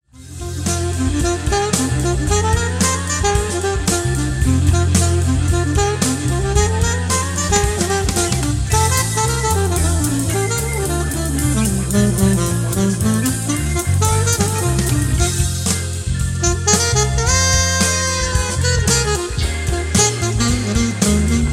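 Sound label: Bop Alto, sax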